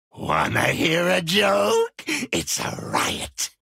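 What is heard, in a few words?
Surprise Party Fiddle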